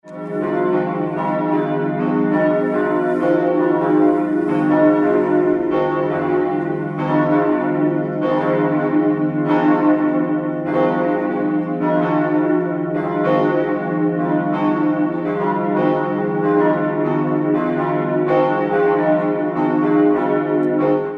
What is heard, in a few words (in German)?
kirchenglocken; ev ki-bommern